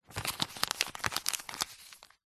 money 20 dollar polymer note light handling movement